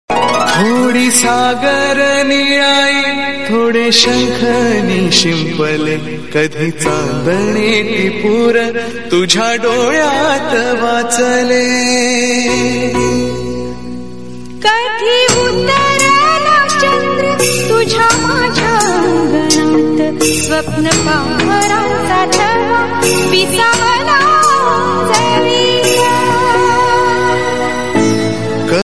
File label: marathi ringtone; vadalvaat